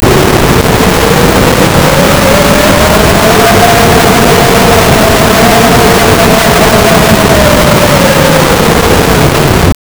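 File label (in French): vent mystérieux type western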